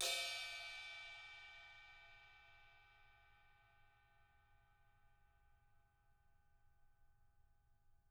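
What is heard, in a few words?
CRASH 18 BELL, CYMBAL; CYMBAL KITS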